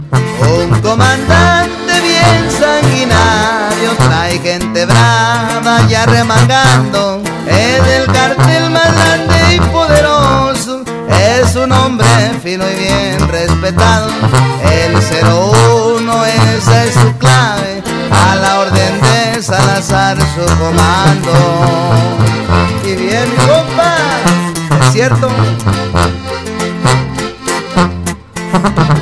clave; latino

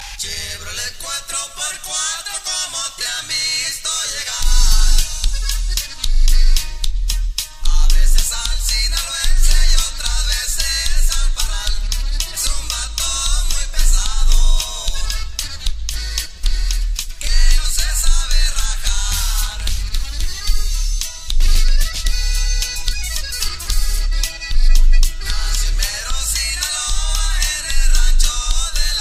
corrido, ringtones latino

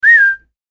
Silbido

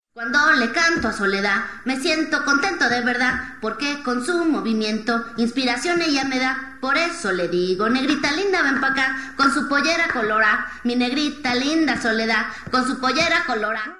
cumbia; latino

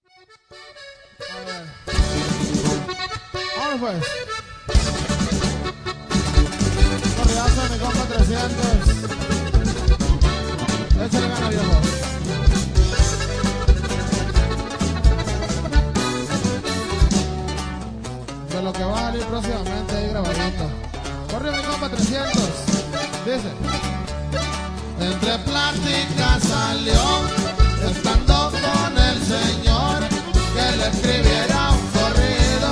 corrido en vivo

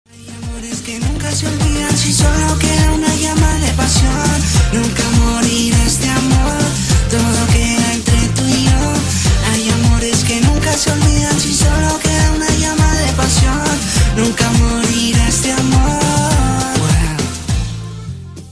reggaeton romantico; latino

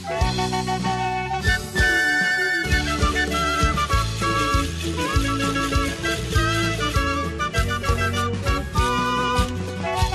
MORENADA PUNO PERU

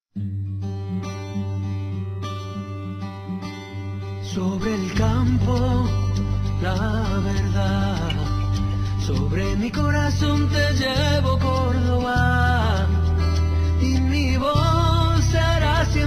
Himno; Cordoba

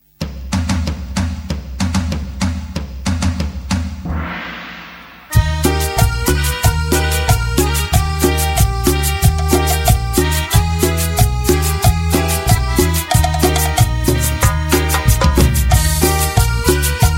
cumbia

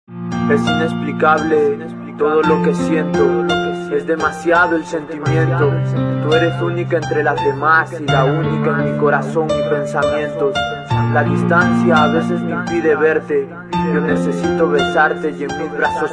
rap romantico hip; hip hop

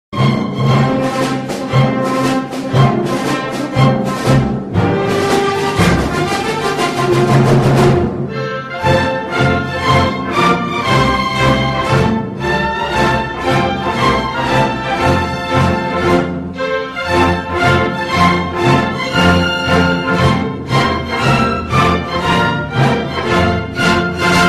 himno cubano; latino

